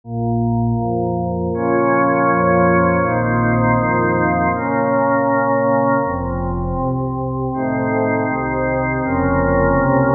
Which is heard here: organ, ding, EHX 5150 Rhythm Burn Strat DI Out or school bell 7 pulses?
organ